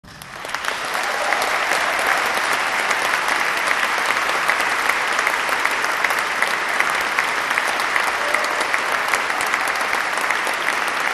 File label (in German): APPLAUS; Froehliche Weihnacht